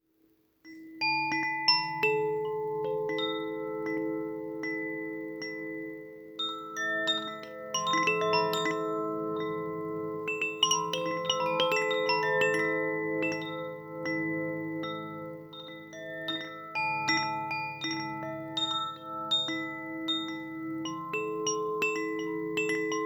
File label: Koshi Aria